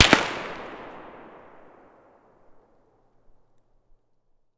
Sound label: sks fire far; Escape from Tarkov